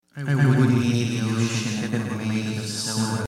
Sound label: soda